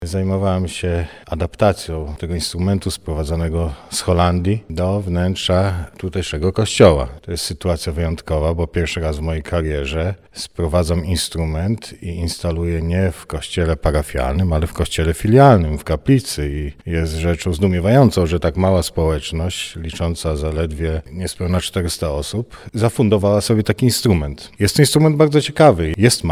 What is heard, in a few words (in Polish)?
organy